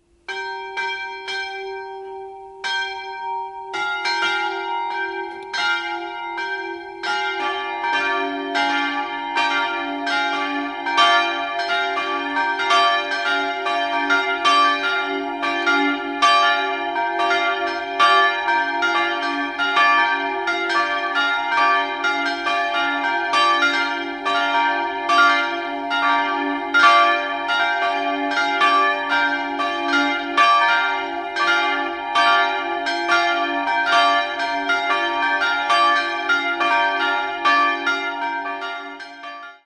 Vogelthal, glocken

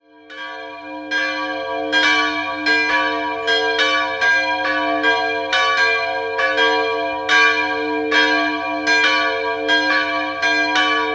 Berghausen, glocken

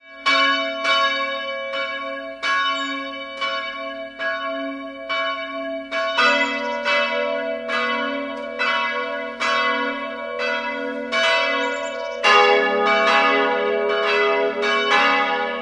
Pelchenhofen, glocken

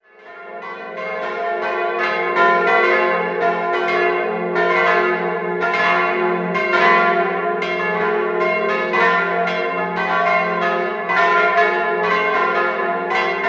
Kneiting, Glocken

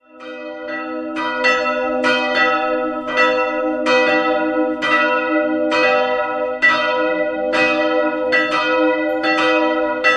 Altenberg, glocken

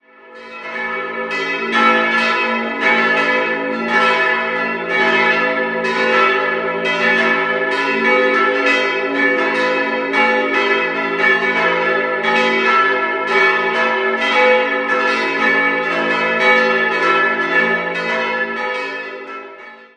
Thalmaessing, glocken; Gotthard

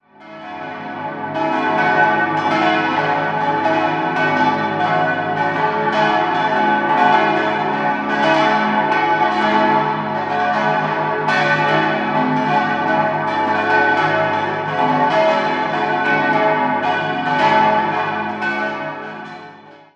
Wohlen, glocken

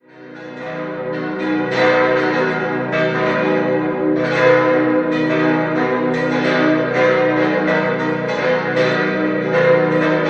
Reutte, glocken

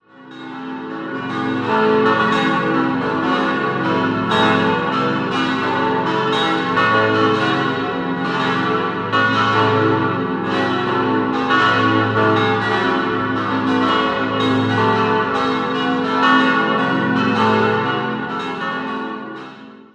Gommiswald, Glocken

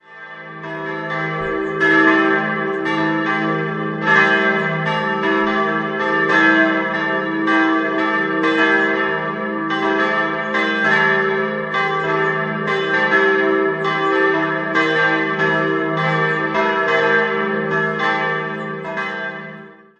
Irgertsheim, Glocken, Filialkirche; St. Laurentius; TeDeum-Geläute: e'-g'-a'; im Jahr 1951; in Passau gegossen; Rudolf Perner

